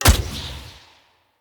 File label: Hunter Bow Ranged Shot Charged Variant; Weapons; Player; Envoy; Bows